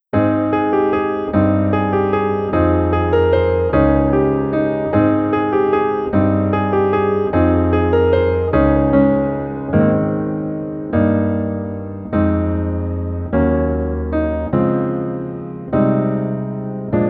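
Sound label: Piano version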